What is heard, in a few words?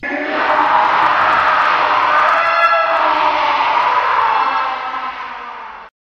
screaming